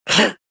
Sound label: push fail; luke